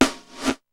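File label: SP1200 kicks; DRUMS + PERC; EMU; Rubber Chicken Software Sample Bank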